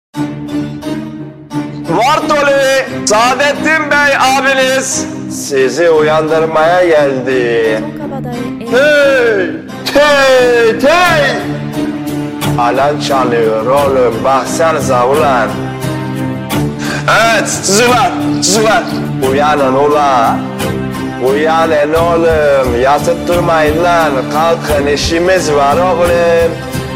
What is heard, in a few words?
Alarm